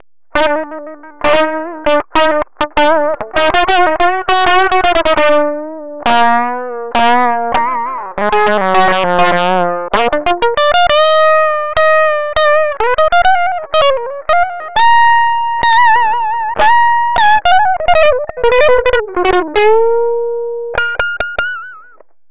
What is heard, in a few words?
crunch, Valve Guitar Preamp